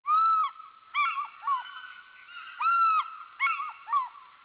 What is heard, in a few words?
African Fish Eagle